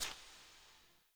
UI Default Theme Arm Glow

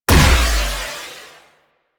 Burst Missile Launcher Fire A; Weapons; Warframe; Railjack

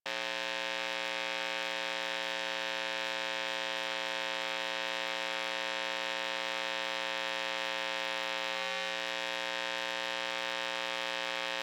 Electrical Box Hum Loop; Ambience; Props; Warframe; NineteenNinetyNine

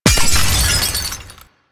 Glass Ring Partially Destroyed; Warframe

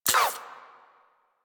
SMG Mech, weapons; Protea

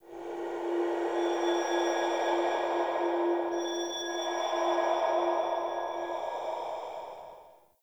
Cave Shrine Spirit Bells, ambience; Midrath Island

